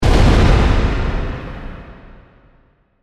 Hit Hard Reverb, Production, Element, Hit, Hard, Reverb; Hits; Sound Design